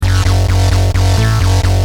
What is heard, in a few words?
Driven, Bass; Industrial